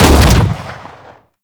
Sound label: Fire Shot; Warframe; Weapons; Strun